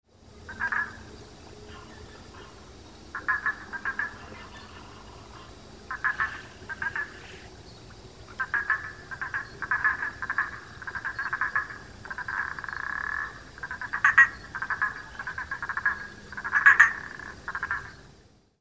Boana prasina

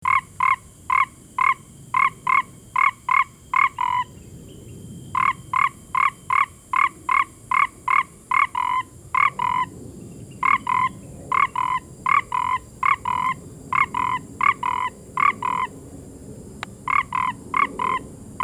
Ingerophrynus quadriporcatus

